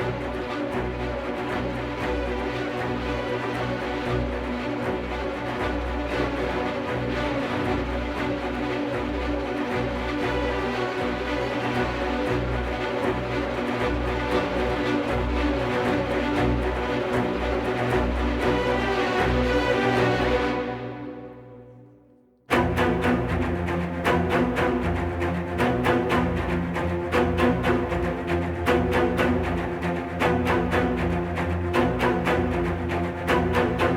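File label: Heavy Blades Short Strings; Grineer; Grineer One B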